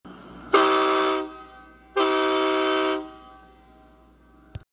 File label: truck horn